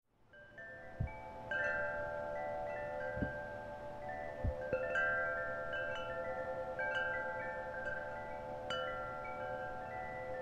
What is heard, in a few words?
metal wind chimes; Zephyr Valley